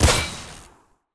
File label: Screwdriver Screw Hit